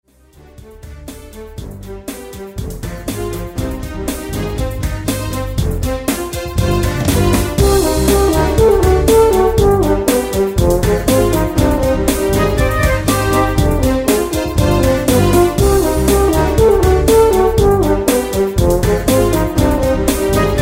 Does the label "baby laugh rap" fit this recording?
No